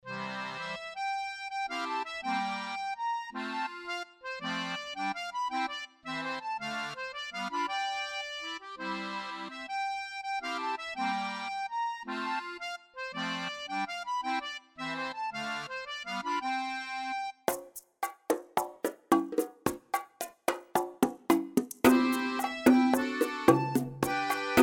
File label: calypso; COLLUDE CALLIPYGE